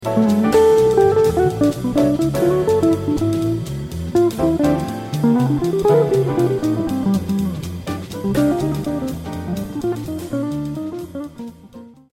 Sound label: tune up